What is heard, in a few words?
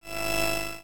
Science Fiction Sci-Fi Electronic Energy Swell Fluttering